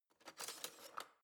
knife wood drawer handle slide

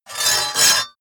sword cut hard scrape

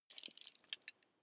metal belt buckle clatter soft

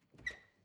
refrigerator door open squeak

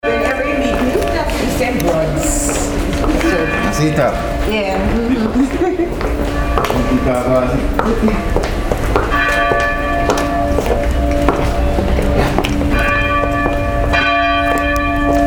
bell walking in caribbean

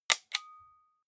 small button generic

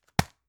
tissue package drop hard

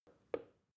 plastic button start click soft slow

